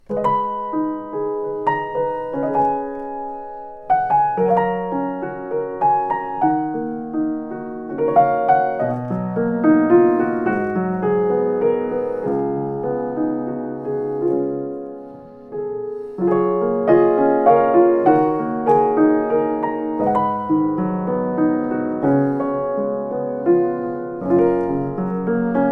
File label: Yamaha U3 X, piano